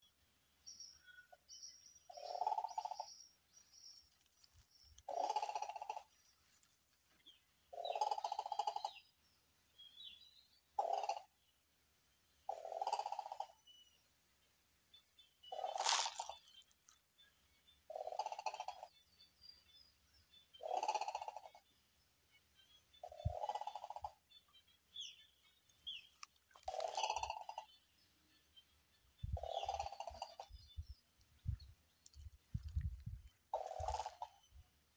kormos ibisz hangja

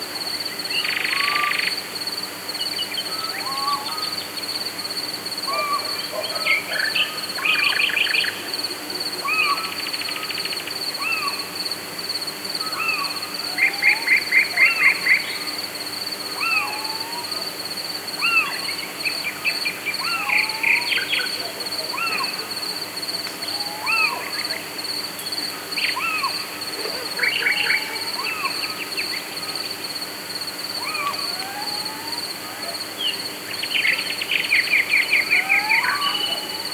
kuvik hangja

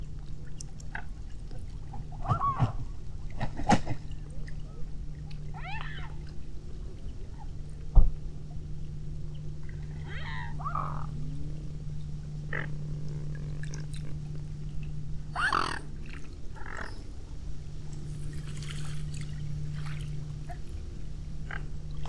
bütykös hattyú hangja

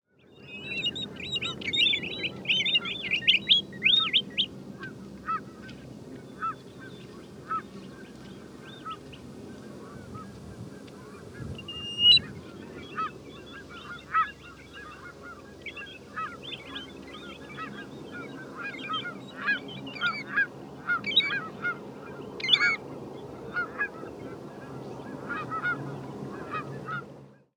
amerikai pettyeslile hangja

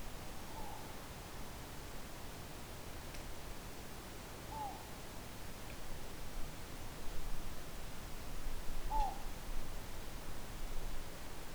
bolombika hangja

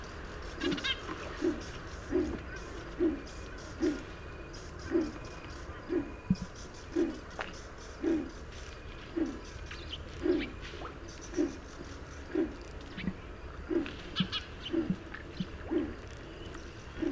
hazi galamb hangja

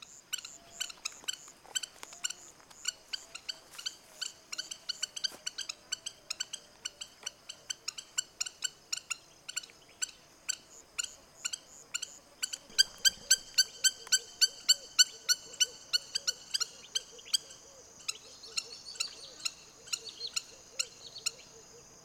tüskés bíbic hangja

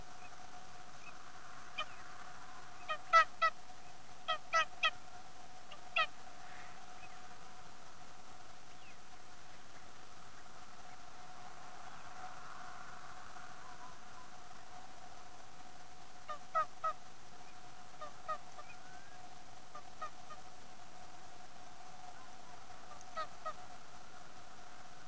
enekes hattyu hangja

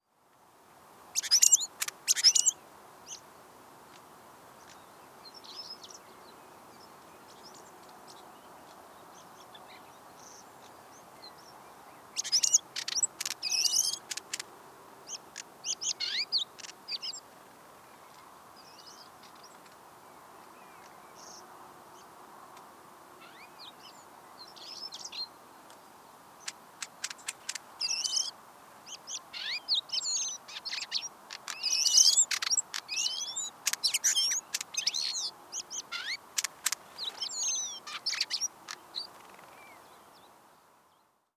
ciganycsuk hangja

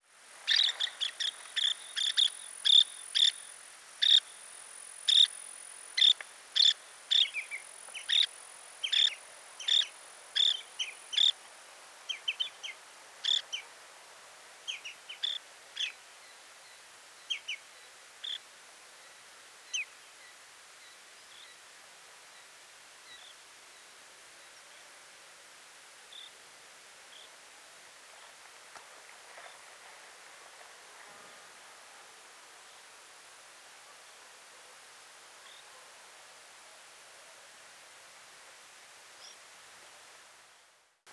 Baird partfutó hangja

